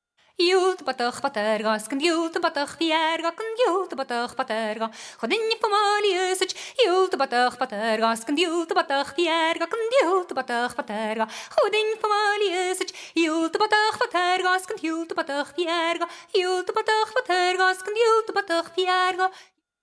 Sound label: mouth music